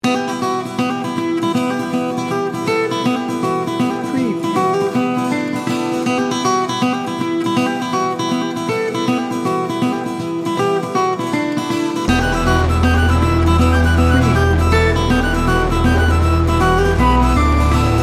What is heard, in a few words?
celtic hero no drums